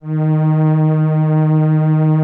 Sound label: SOLINA, String Machines